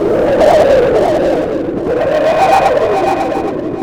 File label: FX WIND; Big Beat Samples & More; AKAI; DISTOTHER KT